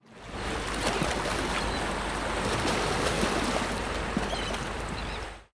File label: Sea And Seagulls